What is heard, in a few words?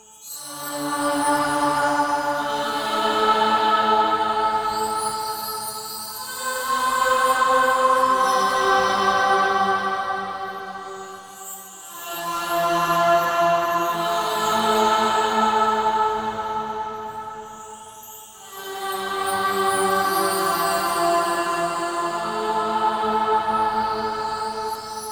Flying Music Loop; Warframe; Bard Quest; Mission Two